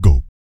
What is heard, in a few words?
MACHINGUN, vocal; ILIO; Vocal Planet; Blues & Soul